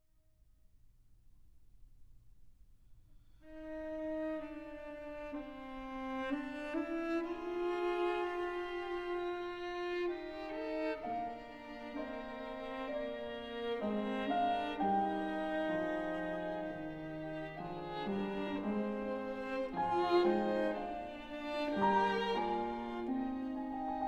Piano Trio in E-Flat Major, Poco Sostenuto. Allegro Ma Non Troppo